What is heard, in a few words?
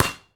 Street Ball Slap Ball Block; SFX Kit